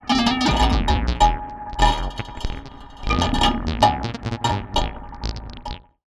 Bass Synth & Delay, contact mic, Tin Of Guitar Strings; musicradar; contact mic madness